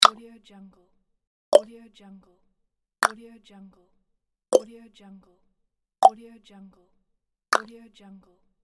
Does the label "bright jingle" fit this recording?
No